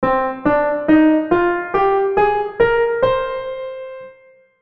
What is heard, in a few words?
c minor